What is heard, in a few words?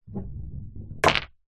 snooker ball roll snooker table into pocket; sport